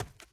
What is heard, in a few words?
Sprint; SandBox Musics